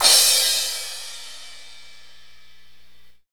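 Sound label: cymbal crash, Roland; Northstar; Drumscapes Roland; Cymbals 1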